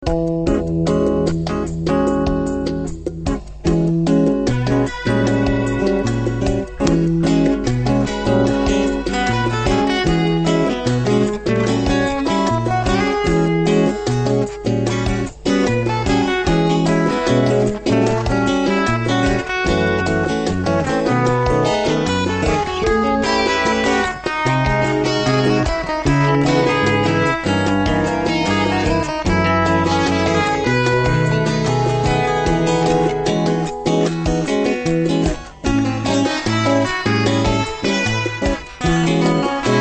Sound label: samba